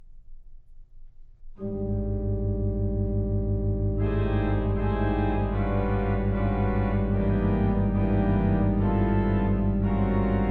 Four Psalms For Flute & Organ

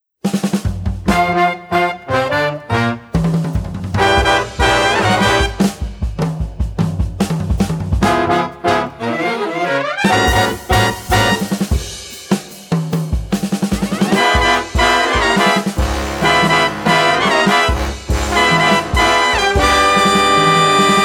Jive Samba

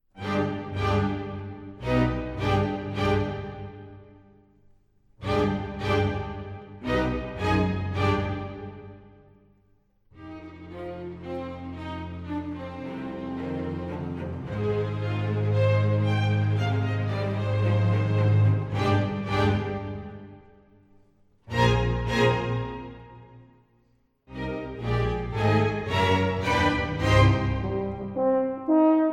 Concerto for Horn and Strings, Allegro libero; Bohemian Horn Concertos Havlík, Stich-P

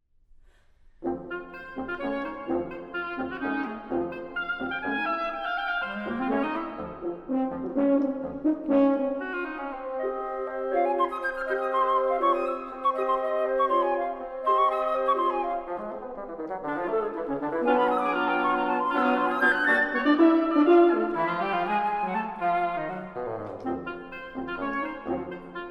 Quintet for Winds

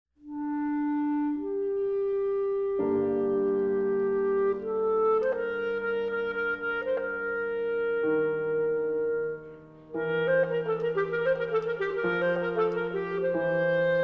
Hebraic Music for Clarinet

